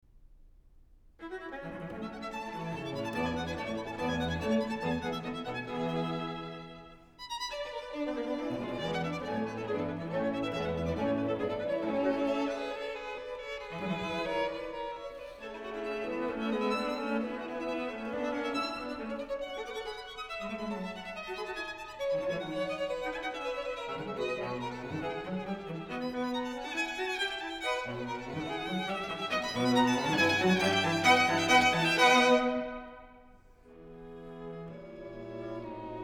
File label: String Quartet No. 5 in A Major, Allegro; Ludwig van Beethoven; Beethoven - String Quartets No. 1-6, Op. 18